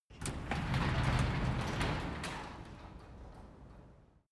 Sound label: garage door open exterior